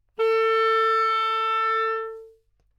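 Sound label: clarinet A4; 開管と閉管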